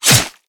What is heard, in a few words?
Whirlwind Strike; Warrior; Idle Champions of the Forgotten Realms